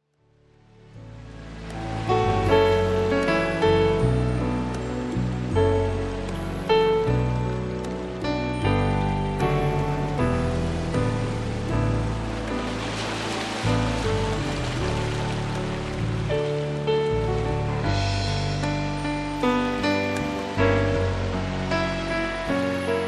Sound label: violin at sea